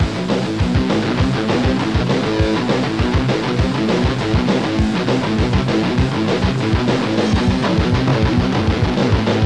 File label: march